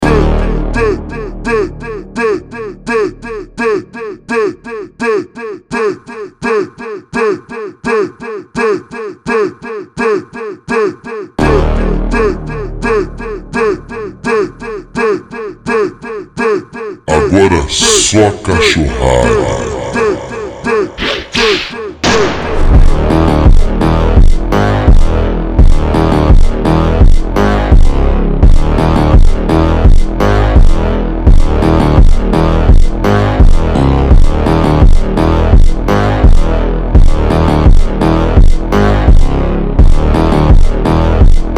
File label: Phonk